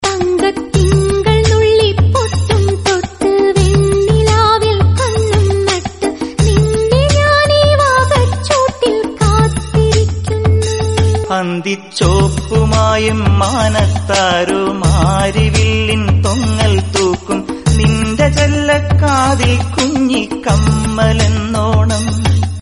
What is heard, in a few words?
malayalam song